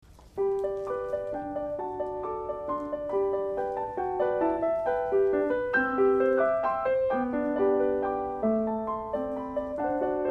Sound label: Music Box Lullaby; Portland International Piano Festival